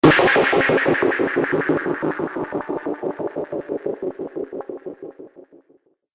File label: Heavy Future Explosion; Battle Sound Effects; Bombastic Booms And Battle Ambience